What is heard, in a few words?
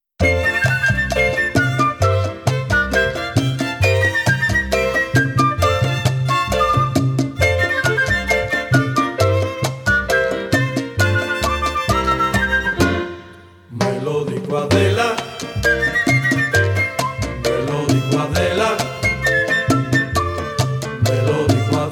Rumba Mambo Cha Cha Cha